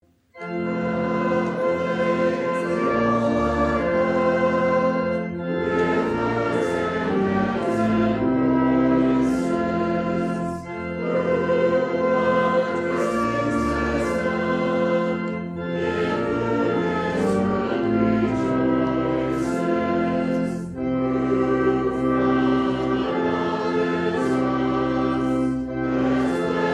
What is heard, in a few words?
hymn, instrumentalists; Hymn 597 Now Thank We All Our God